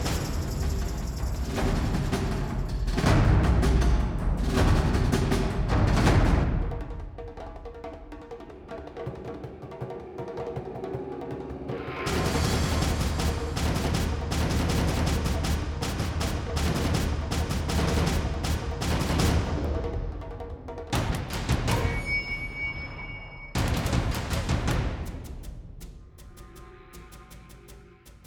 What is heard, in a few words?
Action Suspense; rwby grimm eclipse